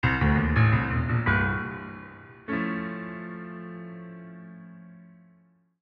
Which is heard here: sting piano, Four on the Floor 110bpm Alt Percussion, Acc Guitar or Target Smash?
sting piano